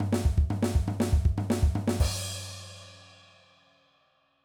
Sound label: Punk Fill, drum